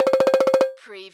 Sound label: CARTOON FAST KNOCKING